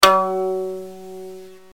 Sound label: Pluck